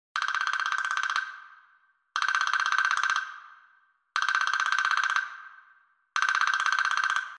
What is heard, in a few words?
Ratchet